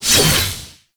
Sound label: Scythe Whoosh; Weapons; Melee; Warframe; Archwing; Exalted Archwing Scythe